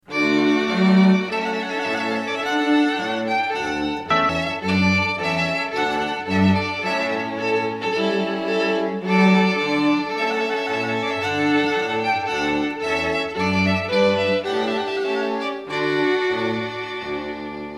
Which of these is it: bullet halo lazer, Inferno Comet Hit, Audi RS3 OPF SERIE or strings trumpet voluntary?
strings trumpet voluntary